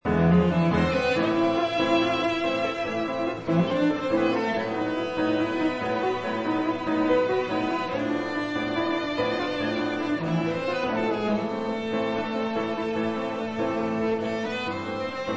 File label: piano trio; canalplus; munich